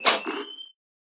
Sci-Fi Weapons-Loading Fx

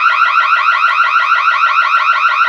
Intruder Alarm Domestic

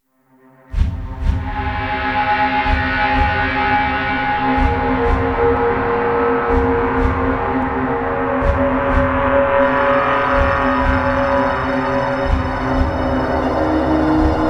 wetting pad and shaking tremolo; chibiru paddo ni bibiru toremoro

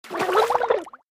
Drowning; Red Ball 4 Vol 1

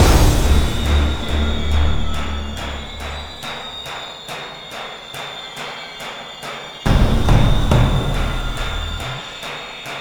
Suspense

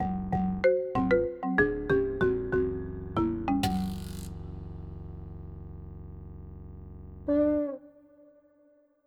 Gameover

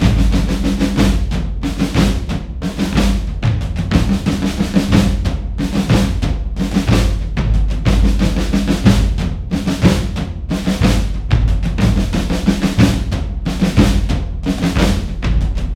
Drums; For Honor

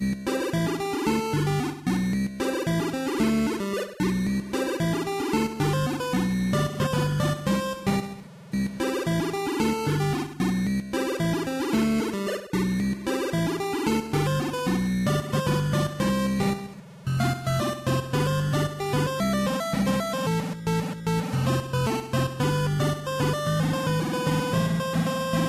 Music Minigame Crabs; save me mr. tako tasukete tako san switch windows gamerip 2018

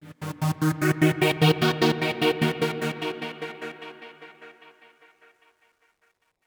Oneshot Synth

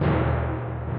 Tymp

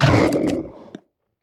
Fishmen VO Attack; We Are the Dwarves

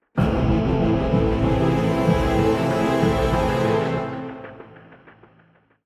190bpm; Legends of Pegasus; windows gamerip 2012

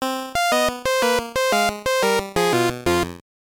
Jingle, soundtrack; Backgammon; gbc

